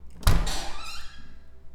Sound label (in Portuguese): Porta Abrindo